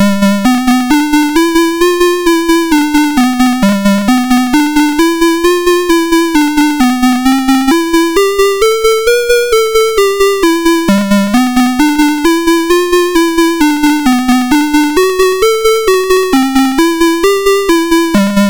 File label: BASIC, sid MOS6581R2; Bunny Hop